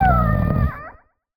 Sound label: cry; Barotrauma; Barotraumatic; Yellowboy